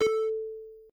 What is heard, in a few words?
sfx zap; SpaceShooter; Space Shooter Redux